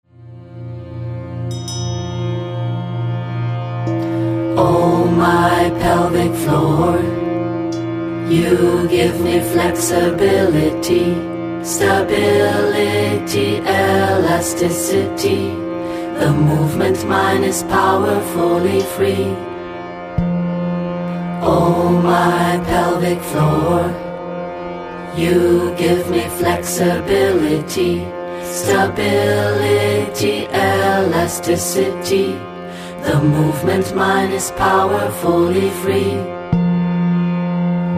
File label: mantra engl